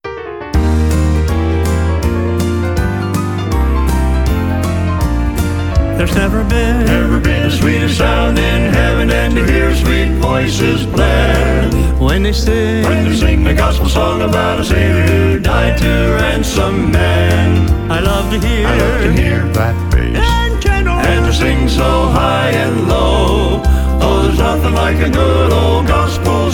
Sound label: Gospel Song